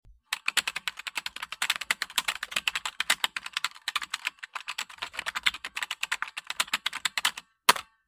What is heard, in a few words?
typing